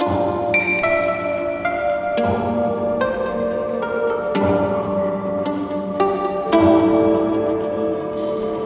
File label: strings, Sad Loops